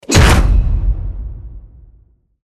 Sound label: rank up; gmod; paris